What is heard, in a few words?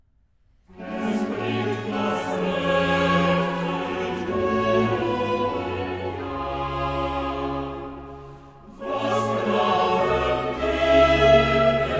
chorale